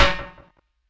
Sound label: SNARE RINGY, DRUM N BASS KIT; JUNGLE BEATS